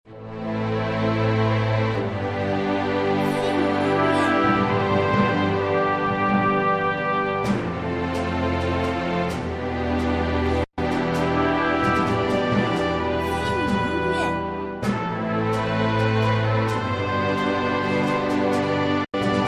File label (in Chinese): 基地-大气-舒缓; 全民海战; 《全民海战》是一款全新的Q版类海战题材游戏。建设基地，研究武器，生产战舰，游戏采用全即时模式，丰富多样的现代武器，逼真紧张的战场气氛，提供几十种战舰，上百种武器和装备，谁会成为下一